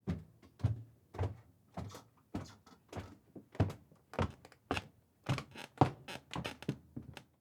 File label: Walk Up Stairs; Footsteps; HauntedBloodlines